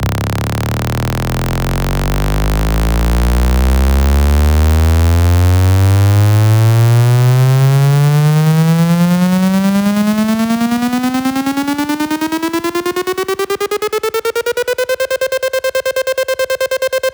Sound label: Sweeps Up, Effects